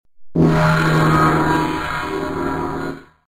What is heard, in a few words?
gong